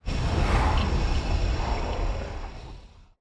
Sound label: idle, monster; elimental; lake